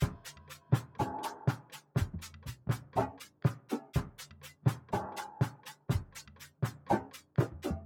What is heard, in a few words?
Drum Loop, 122 BPM Percussion; Cymatics; Vibes II Drum Loop Collection; Connection Drum Loop